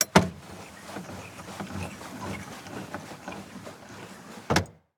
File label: Semi Truck Window; Large Vehicles; Blastwave